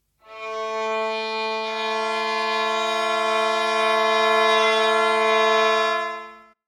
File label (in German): geige